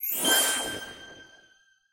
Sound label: Spawn Special Candy; candy crush saga gamerip